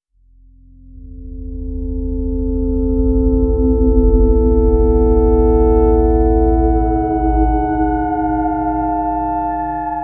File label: FM Bender, Melodic; Sonic Foundry (Sony Creative Software); Slow Silhouette